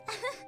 あはっ; Flashpoint Ultimate 13; マシュマロ食べる

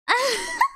あっは, 笑い声

